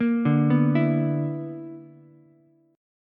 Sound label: B-Minor Major 11th, Ukulele C6; BmM11; arpeggiated; 3,2,7,7; JGuitar